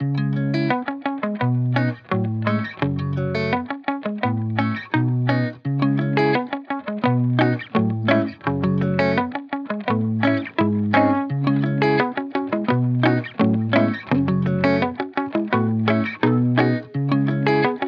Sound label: 170 BPM C# Min, Guitar; Playground; Cymatics; Miami Guitar Pack - Pt 2